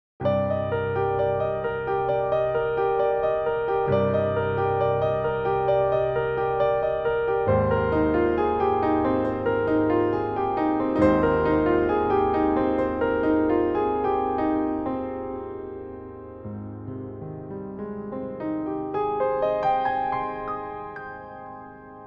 Ambience; DKC

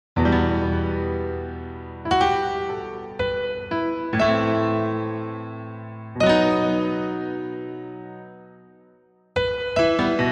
Piano Roll; Dillons Rolling Western The Last Ranger

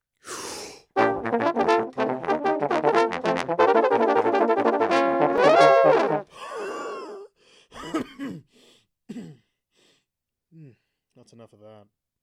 Note-Accurate Arpeggios; Kirby Super Star

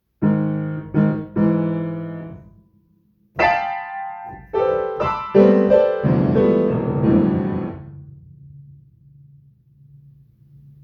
Jennifer Plays the Piano; Clock Tower; Horror Games Month

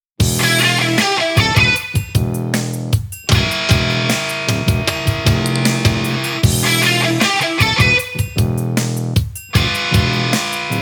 Warehouse District (Suspense); Syphon Filter The Omega Strain

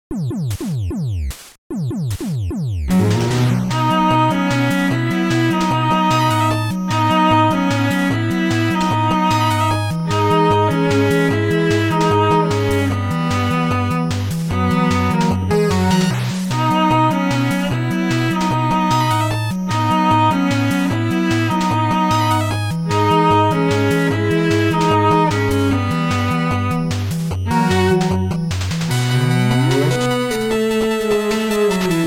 Cello Version; Mega Man

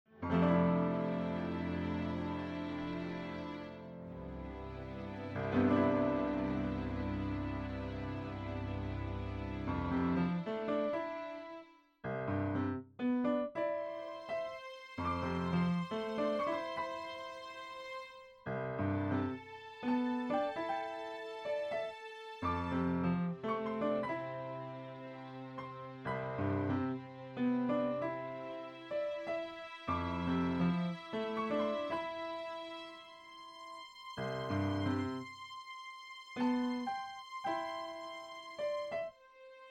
Ocarina of Time Intro; Legend of Zelda Ocarina of Time

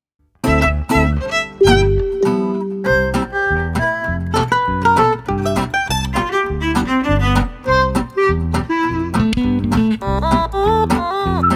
Violin; Super Mario Bros.

